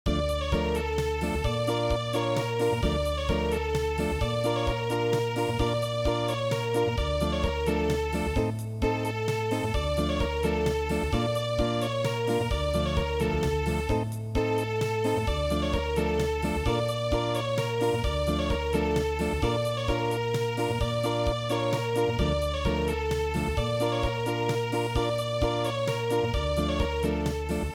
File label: Acoustic; EiAman